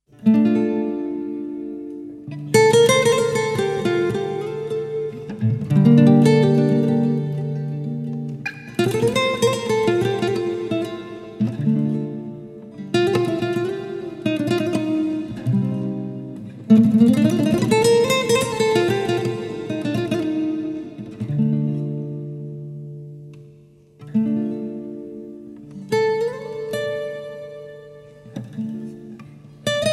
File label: Instrumental